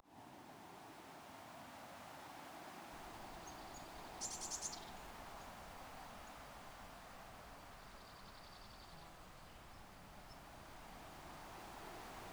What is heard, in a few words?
ambiance; seeding-planets